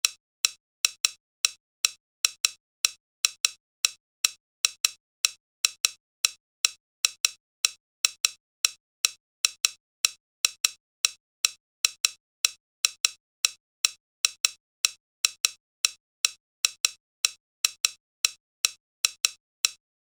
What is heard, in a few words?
Tick Phase, Rhythm